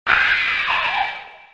Screech

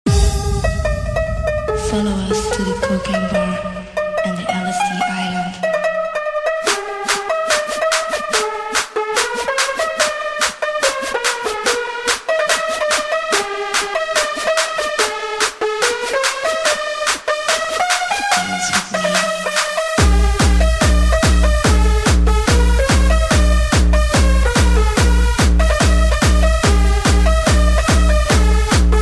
Jumpstyle

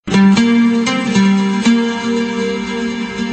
Melody Gitar Tune

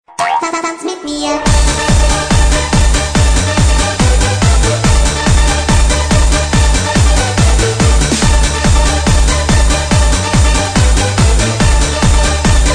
Jumpstyle

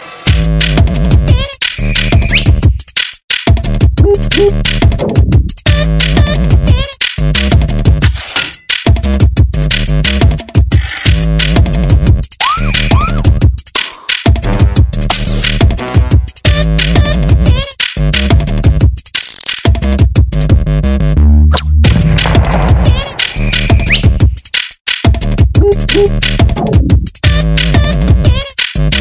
Chill Dnb; meloboom

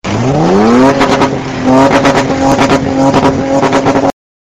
Toyota Gx Rev

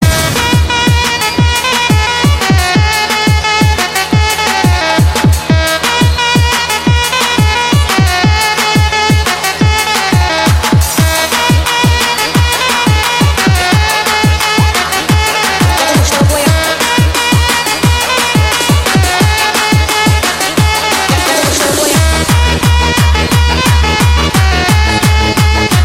Cool Music

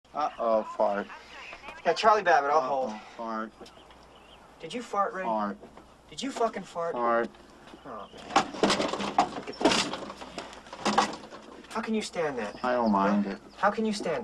Uh oh fart; rainman

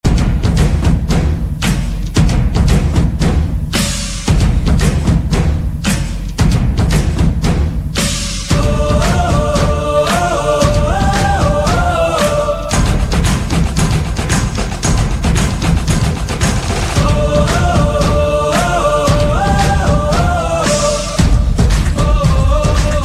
Rocking Drum Beats

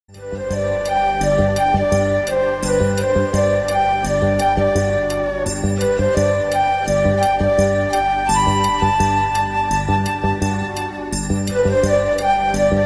Taal Music

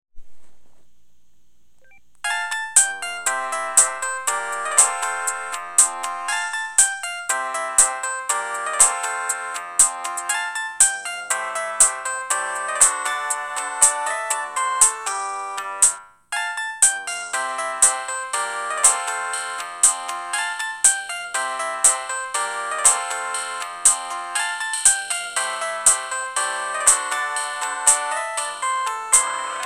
Plink

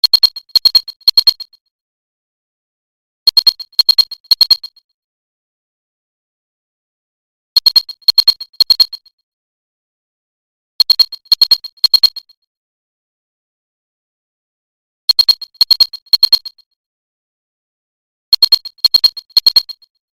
Army Call Tone

